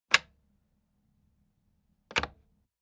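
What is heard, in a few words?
Telephone Pick Up Hang Up Heavier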